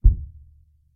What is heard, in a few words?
Thump distant; Project Zomboid